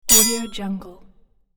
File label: metal sword stick snap resonant; game